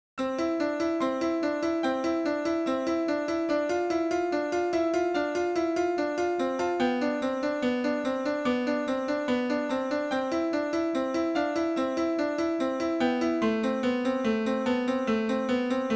Piano; SimCity 2000

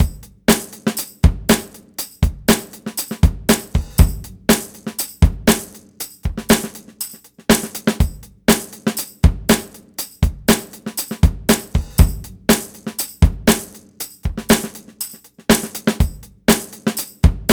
Music Test Drums Loop; Bound by Flame

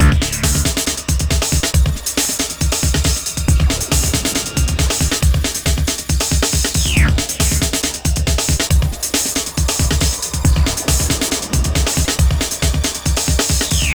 Med Groove 1 Lo; Unreal II The Awakening; Acheron

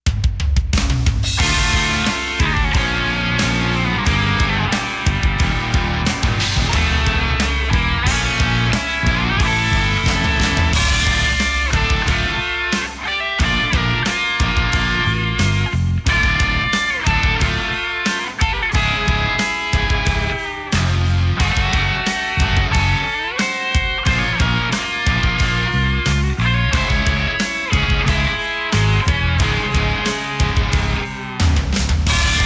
Match Loss; mario strikers football battle league; Against - Rosalina

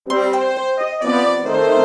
Gameover

